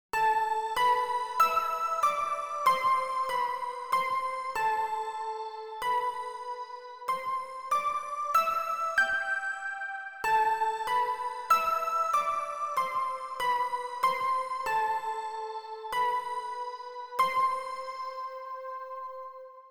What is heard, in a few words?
Pluck Melody